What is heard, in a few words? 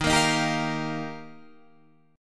D sharp Major Add 9th, Guitar, Standard; D#add9 strummed; 11,13,13,12,11,13; JGuitar